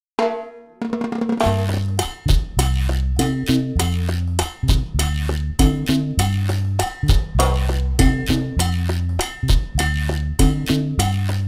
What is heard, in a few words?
Chachacha